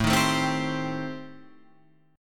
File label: A-Minor 7th Flat 5th, chord; 5,6,7,5,8,8; strummed; Am7b5